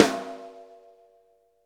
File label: SNARE; E-MU Formula 4000 Series Vol. 1; Hip Hop Nation; Bonus Perk